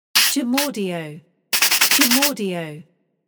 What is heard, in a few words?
Brushing